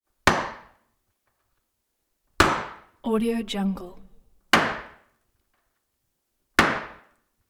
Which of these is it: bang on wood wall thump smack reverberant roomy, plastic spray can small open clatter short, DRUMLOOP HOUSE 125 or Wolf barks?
bang on wood wall thump smack reverberant roomy